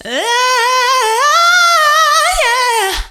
AD LIB AHH, Female Vocals; PlatinumPhattCD2; E-MU Producer Series Vol. 8 – Platinum Phatt

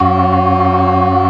organ, all stops; Keith Emerson Signature Series

